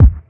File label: classic hip hop kick; Classic Hip Hop Mega Kit